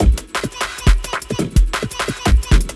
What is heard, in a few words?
clap backz, Breaks; neuro; Sinistarr